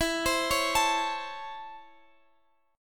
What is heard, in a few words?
C sharp Minor Major 7th Sharp 5th, chord